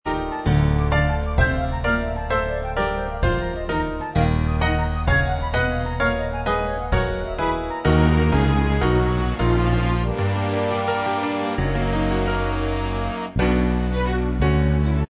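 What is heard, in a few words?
instrumental, backing tracks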